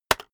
keyboard external enter hit very fast

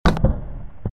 noisy impact

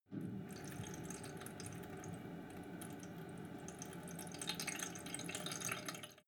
sink drain light

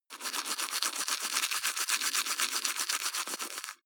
teeth brush wet closed front

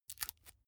package tape handle short

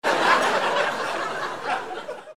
laugh

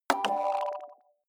sci-fi notification

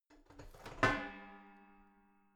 garbage can open lid soft hit wall

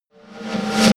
reverse hit drum roll

